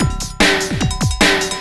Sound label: industry 149, drums; INDUSTRY BREAK 149; Loopasonic